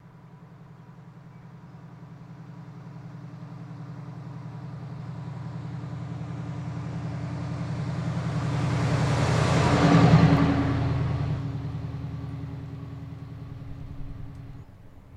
Blazer Drives By Slow, chevy blazer

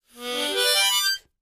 Mouth Organ Glissade, harmonica; woodwinds

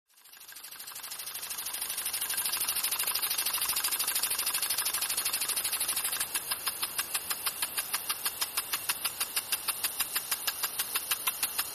Water Sprinkler W Ret

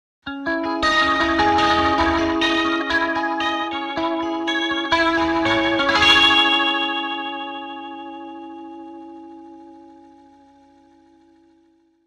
Elect Guitar Sharp, electric guitar

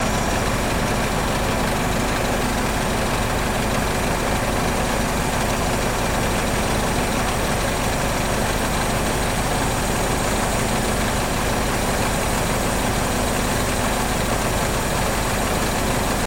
Forklift Idl Looped

